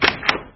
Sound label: door open